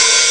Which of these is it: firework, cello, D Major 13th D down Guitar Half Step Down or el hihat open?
el hihat open